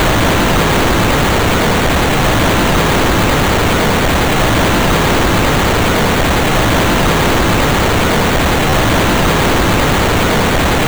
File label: player thrust; gamejam-client